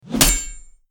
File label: parry, sfx; asciinevrdie